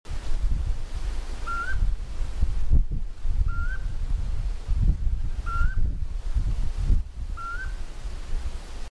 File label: Lurocalis semitorquatus